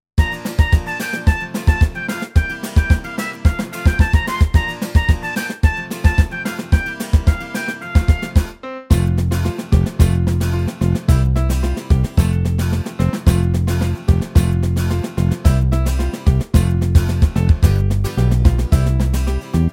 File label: Karaoke